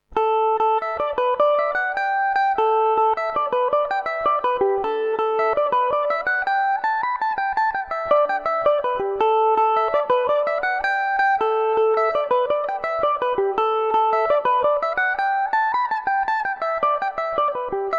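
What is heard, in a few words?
jig A dorian revelation, irish mandolin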